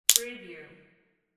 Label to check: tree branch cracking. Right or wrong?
Right